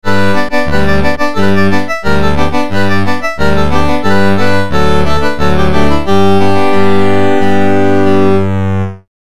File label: Tango Acordian